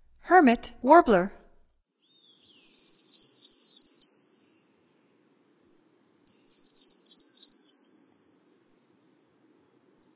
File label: Hermit Warbler, Songs of Birds of North America; Stokes